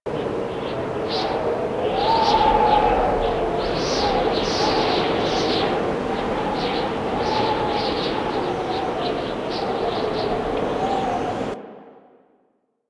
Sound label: Wind Howl A; Warframe; Corpus; Ambience